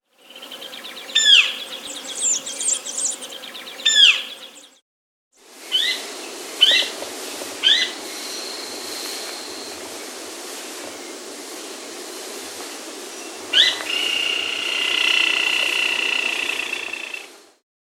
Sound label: Golden-Olive Woodpecker (Piculus Rubiginosus), Andean Birds; S. America